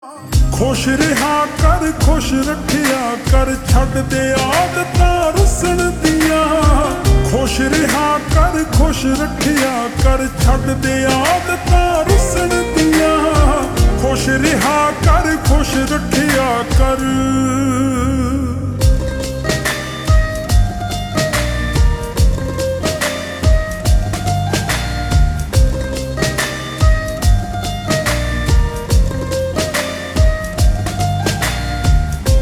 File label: Ringtone Slowed Reverb